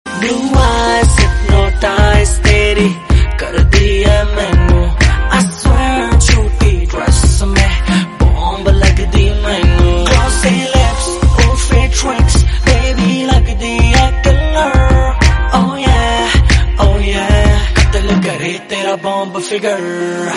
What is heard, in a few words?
Hindi Ringtone